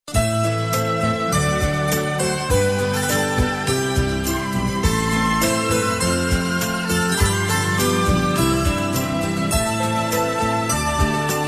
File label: Instrumental